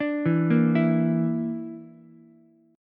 A sharp Major Flat 5th, Ukulele; arpeggiated; JGuitar

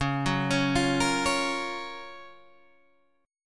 Gb Major 7th Db, guitar

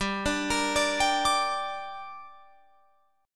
G Minor G down Guitar Open F, chord; Gm arpeggiated

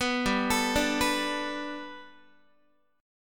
G Major Add 9th Guitar, chord; Gadd9 arpeggiated; JGuitar

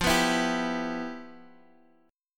G 6th F; G6/F strummed

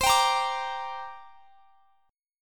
C 7th Suspended 2nd; C7sus2 strummed; x,x,22,24,23,22

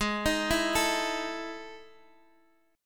E Major 11th chord

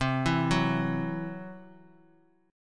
C Major Flat 5th C down Bass Standard; arpeggiated; CMb5

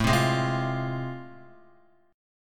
A Minor Major 7th Sharp 5th A, Guitar; AmM7#5 strummed; 4F,4A,5C,5G,5C,6E; x,0,0,1,0,1

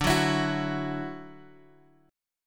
D-Major Add 9th D down Guitar Open F; Dadd9; strummed

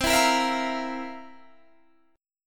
C 11th, C, down, Guitar, DADGAD

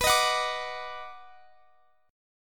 D sharp Minor Major 7th Sharp 5th B

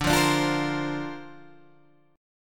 D-Minor 7th Sharp 5th D Guitar Open G, chord

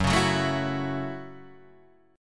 Gb Minor 7th

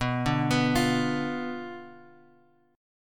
B Diminished B down Guitar Open F; Bdim arpeggiated

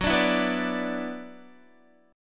Gb 7th Suspended 4th Sharp 5th Gb, Guitar; Gb7sus4#5 strummed

